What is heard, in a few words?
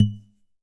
Classical Guitar Extended Technique Plink